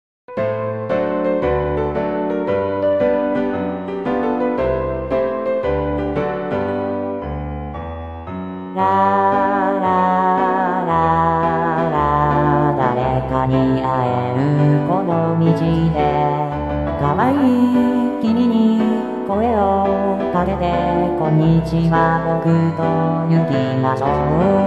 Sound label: chor-b